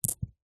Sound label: industrial metal ball bearings x2 down on carpet